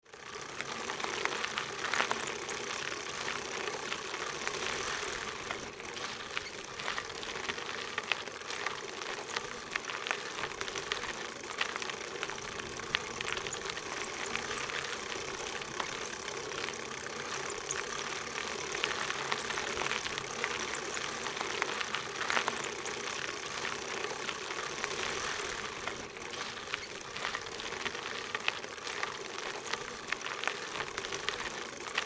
Bicycle pedaling medium speed close perspective to chain